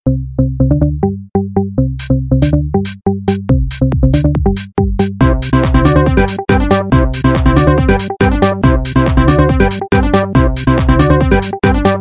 remix ringtones

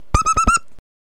beep

